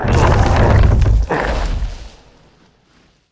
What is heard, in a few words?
legendary tree die